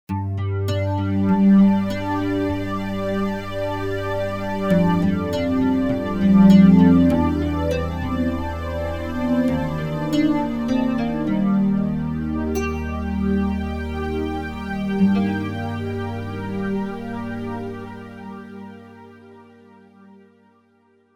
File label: Flanged Nyln, Roland JV-880; Factory Patches